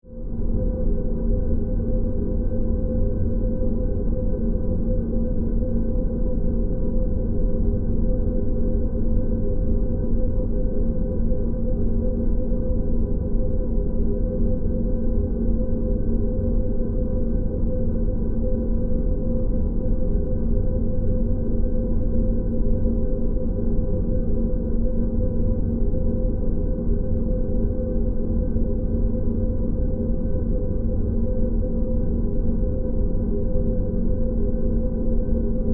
Ambience Cargo Bay, Scifi, Ambience, Cargo, Bay, Science, Fiction; Hum